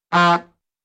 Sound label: short bulb horn honk